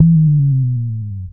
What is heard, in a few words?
Percussion; Vengeance Samples; VEH2